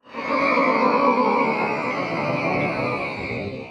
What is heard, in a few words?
ship engines; World Of Warships